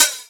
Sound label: OPEN HH; USB Soundscan vol.51; AKAI